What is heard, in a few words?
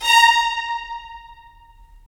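strings violins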